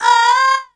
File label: VOC, 160 BPM; Ueberschall; Drum & Bass Flash Trackz